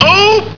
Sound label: pain; Alien Arena; marvinmartian